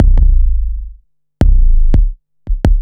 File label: 808 Loop BD 85, Roland 808 Drum Loops, 85bpm